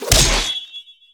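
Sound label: Battle Book Critical; Etrian Odyssey III HD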